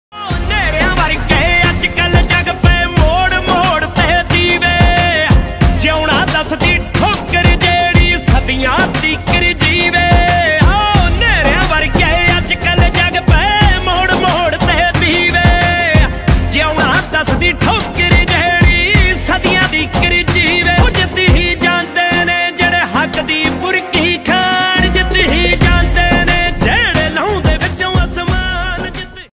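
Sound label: punjabi ringtone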